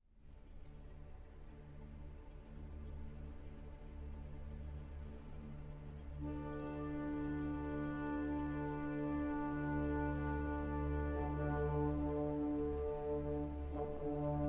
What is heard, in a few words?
Symphony in D Minor, Feierlich, Misterioso; Symphony No. 9; WAB 109; Original 1894 Version